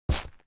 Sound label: land; Owlboy; Otus